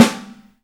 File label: JAZZ SNARE; E-MU Formula 4000 Series Vol. 1; Hip Hop Nation